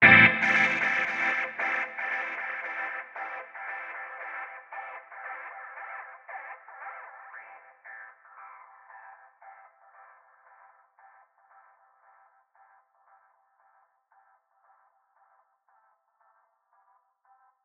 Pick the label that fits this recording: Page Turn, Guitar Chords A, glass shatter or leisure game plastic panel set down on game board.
Guitar Chords A